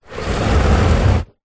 foley cardboard box small drag carpet

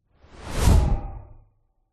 fantasy magic spell fly fast past whoosh; zapsplat

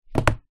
household pocket photograph album down on table

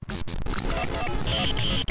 glitch digital; silverplatter audio

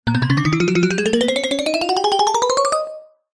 cartoon footsteps playful fast ascend

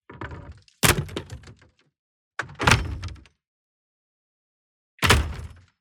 Just Impacts SIMPLE; soundbits

